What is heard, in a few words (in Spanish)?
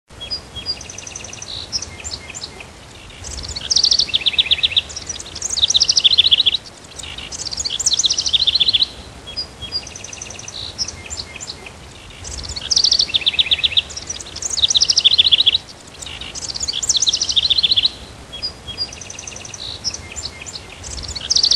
Pajaritos; Abrapalabra La Magia de Aprender a Leer